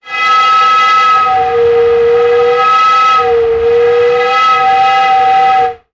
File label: sound design effect air pipe pressure